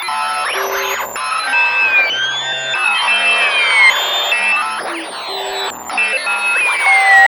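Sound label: Interference, Hard & Loud Techno; USB Soundscan vol.01; AKAI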